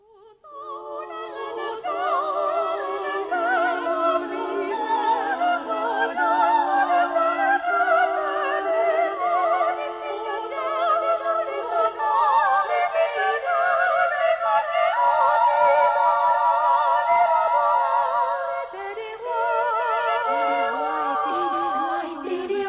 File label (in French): Choeur des feux follets